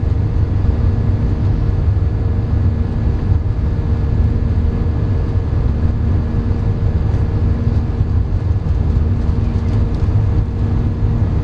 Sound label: Flight noise